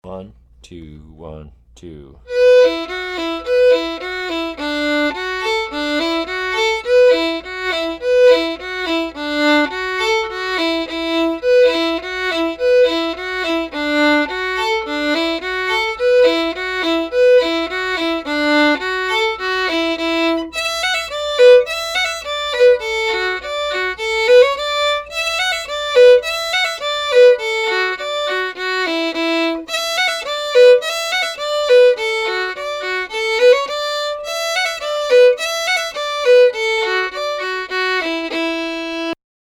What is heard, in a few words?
polka fast; races polka